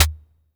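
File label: vermona drm1 mk3 snare drum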